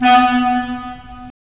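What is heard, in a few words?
wind clarinet; Roland - LA Composer vol. 3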